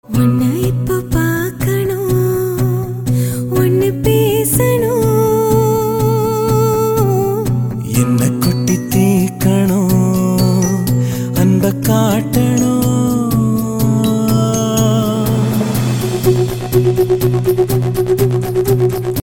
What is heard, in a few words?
female ringtone